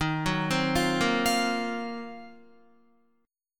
Eb Minor 6th Add 9th Eb down Guitar 4E,4A,5D,5G,5C,6F; arpeggiated